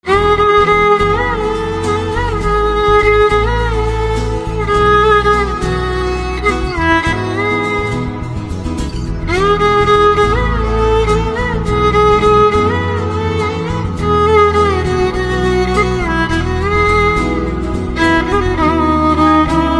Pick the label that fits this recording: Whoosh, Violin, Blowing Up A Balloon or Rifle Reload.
Violin